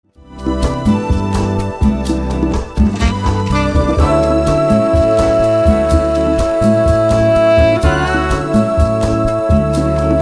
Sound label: DJ Combo with sax